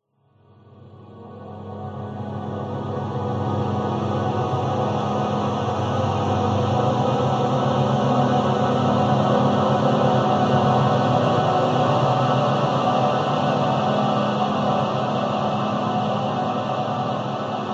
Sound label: horror pad atmos dark sinister evil ghostly choir